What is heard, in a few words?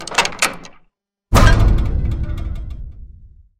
vault unlock